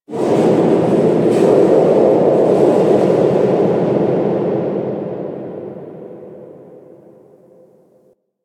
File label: Hub Metal One Off; Steel Meridian; Warframe; Tenno